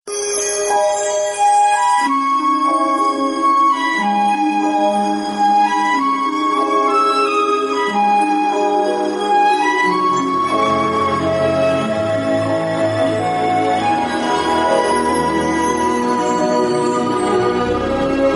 lovely flute nature sound

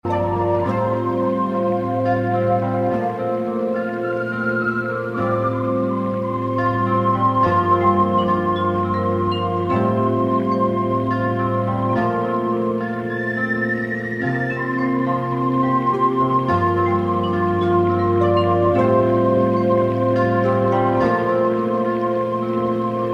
cool clear flowing water music tone

